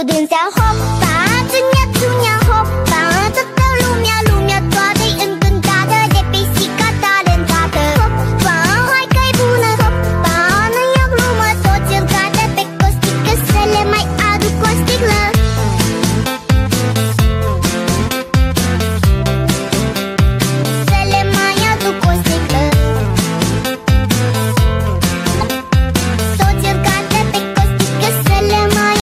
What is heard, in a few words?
hollywood ringtone